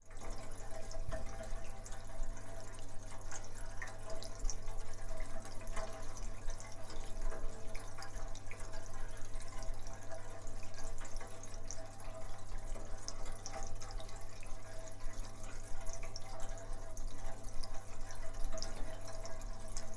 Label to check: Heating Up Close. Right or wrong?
Right